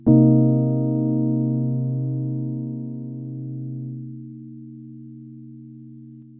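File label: EPIANO Soft Rhodes Chord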